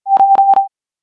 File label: battery charging, Samsung SGH-i600